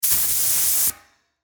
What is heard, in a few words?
Air Insert Valve, Industry, Air, Insert, Air, Compressor, Valve; Air Pump Sound Effects; Blast Wave